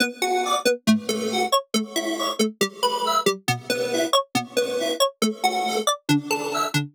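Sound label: 138 bpm synth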